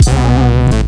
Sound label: Synth